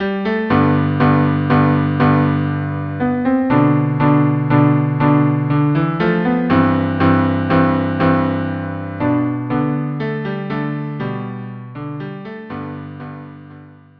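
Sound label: cello duo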